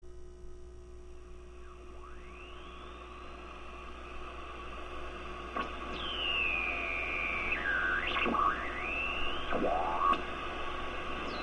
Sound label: Machine Electronic, static